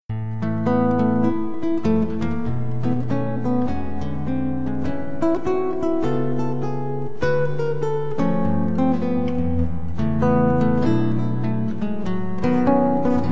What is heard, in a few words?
Solo Guitar; Intermediate; Jazz